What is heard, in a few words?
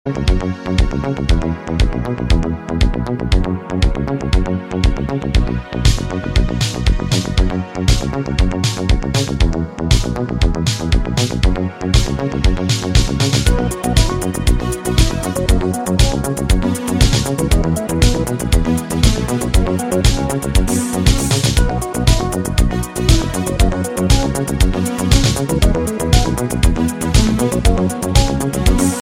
laser dance ringtone